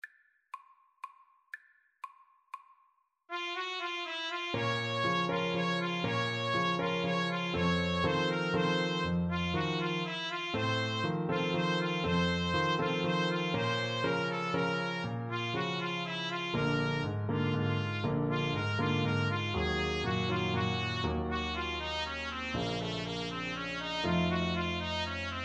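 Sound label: trumpet cello piano, Trumpet-Cello Duet; la partida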